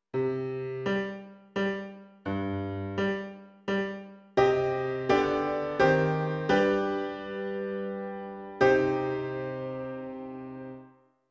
Piano

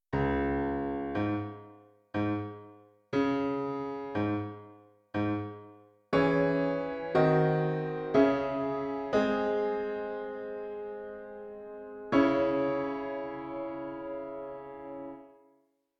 Piano

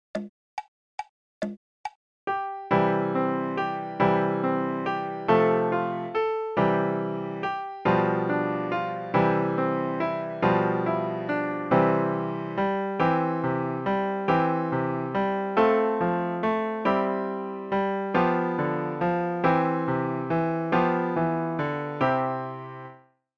Good Morning In C

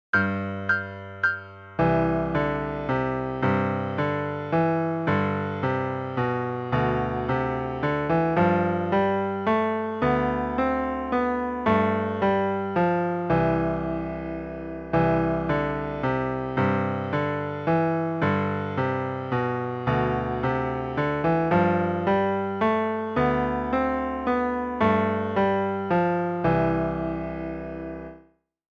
Monkey Mirror Duet

